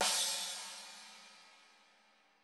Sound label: CYM; Bob Clearmountain Drums I